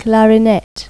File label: CLARINET; Pipo-Aprende musica con Pipo